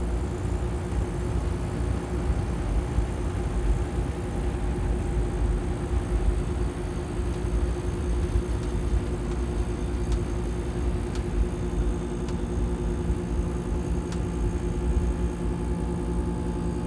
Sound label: interior office, ambient; nuke